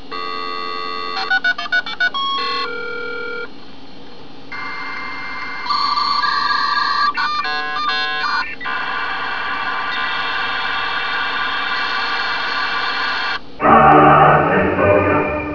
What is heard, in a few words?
modem dial; de prodigy2k